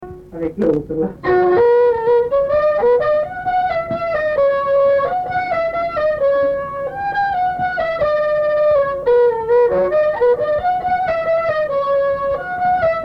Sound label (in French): marche